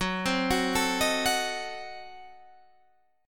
F sharp Diminished 7th F sharp Guitar Half Step Down